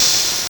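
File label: ChipShop GB Open hat; DRUMS; HATS; ChipShop Deluxe; ChipShop Hits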